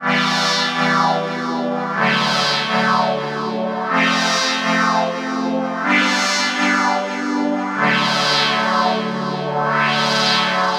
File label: babylon pads